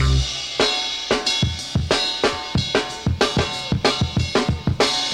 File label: 124 bpm drum loop sample c key, kick tuned to the C note